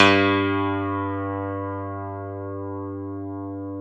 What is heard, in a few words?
PNO, Electrics, Grands; CP70; Club-50 - Foundations Roland